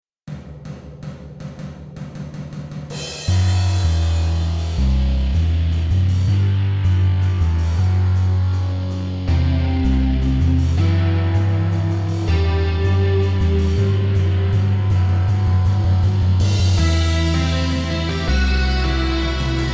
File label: title theme drum in version